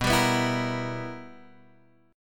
B Major 7th Suspended 4th Sharp 5th, chord

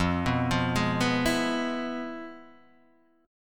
F Minor Major 7th Flat 5th Guitar Drop D

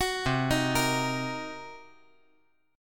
C Minor 7th Flat 5th, chord

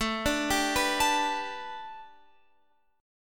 A 9th Suspended 4th, down, Guitar, Open F; A9sus4 arpeggiated

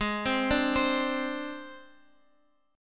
Ab Major Flat 5th Ab, Guitar Open F; arpeggiated; x,x,8,7,5,7

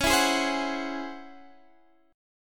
C sharp Minor Major 11th, Guitar, Drop D

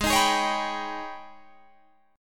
Ab Minor Major 11th Ab, Guitar Open G; down

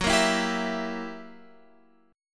F sharp 7th Flat 9th Guitar Open F, chord; F#7b9 strummed

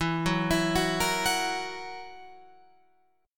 E Suspended 2nd Flat 5th E down Guitar Open F; arpeggiated; JGuitar

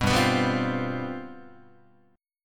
G 11th, Guitar; G11 strummed

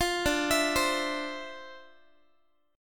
D Minor 9th, down, Guitar; Dm9; arpeggiated

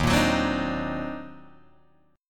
Eb Minor Major 13th, Guitar, DADGAD; EbmM13; strummed; JGuitar

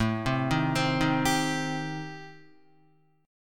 A-Minor 7th Flat 5th, Guitar, chord; arpeggiated; JGuitar

